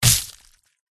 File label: mini dragon attack contact up